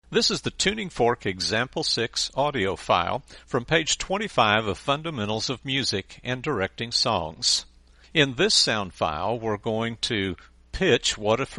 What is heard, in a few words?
Tuning Fork